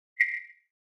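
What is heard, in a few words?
Flash Beep Electronic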